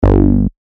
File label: Bass Shot G; synth shots tech house; Chicago; revealed